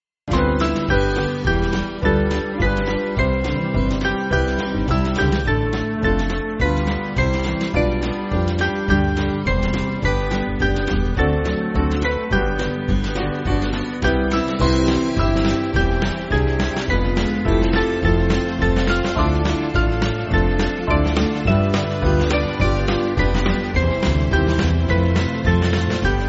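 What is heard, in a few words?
Band, Backing Files for Churches; O Blessed Saviour; Goodrich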